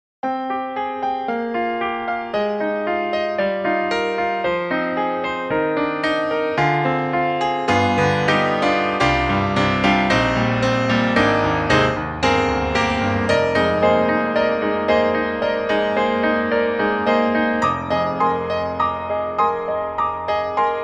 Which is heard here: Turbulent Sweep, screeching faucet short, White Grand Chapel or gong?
White Grand Chapel